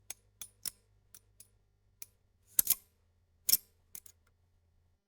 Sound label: Nail Cutter